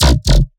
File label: Dirty Bass 150 Ringtone; One-Shots; Bass Hits (Dirty); Ghosthack; Color Bass Essentials